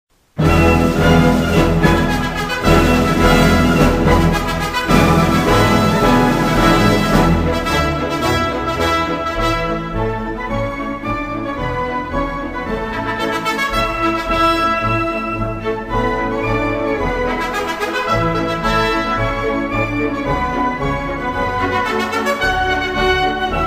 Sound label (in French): Hymne Monaco